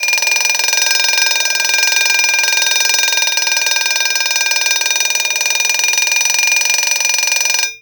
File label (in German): Wecker